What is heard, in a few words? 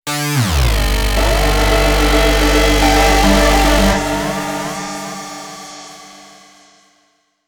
Lost Tone; Arkanoid vs Space Invaders